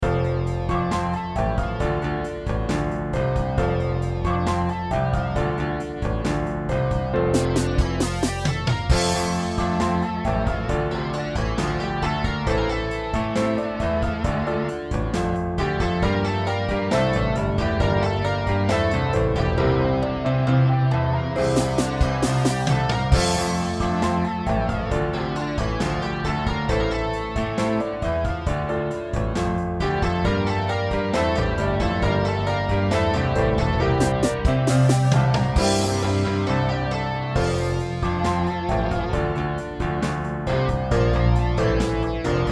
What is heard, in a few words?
60s style